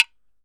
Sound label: Slapping Metal on Plastic